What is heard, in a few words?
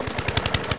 MOWER; Screen Wreckers